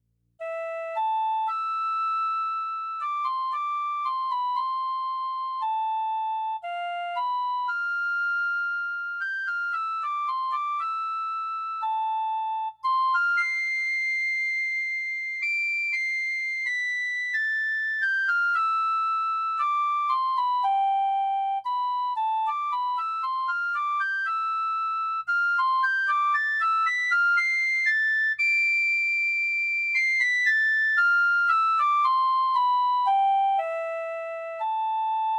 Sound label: Piccolo Lyrical